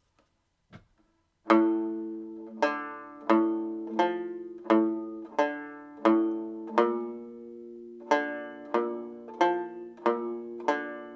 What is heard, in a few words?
Tamlin slow, banjo